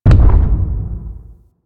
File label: Distant Step; Enemies; Steps; Warframe; Corpus; Arachnoid Camper